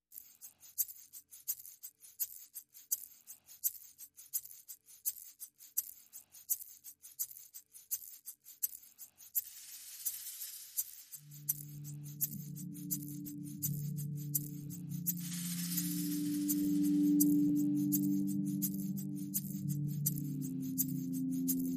Pads and Percussion

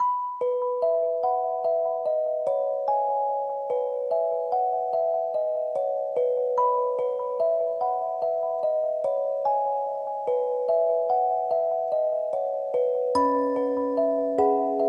Lullaby Seq

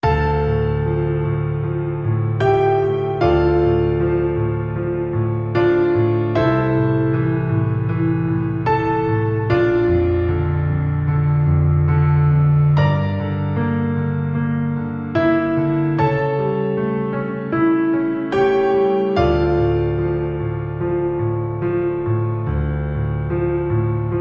Piano

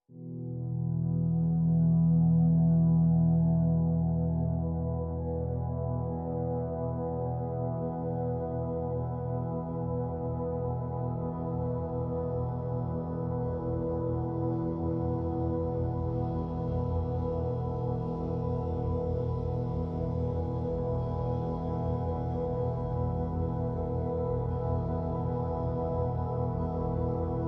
PADS (E)

